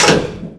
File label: door open metal